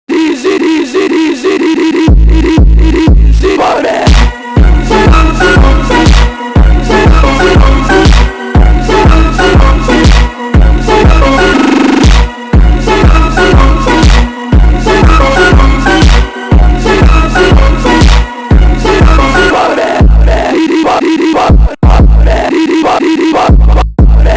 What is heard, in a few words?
Funk sped Up